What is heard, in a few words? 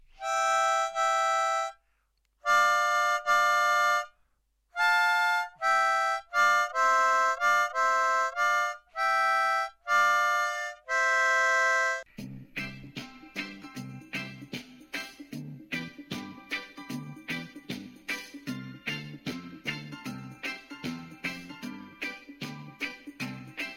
10 hole harmonica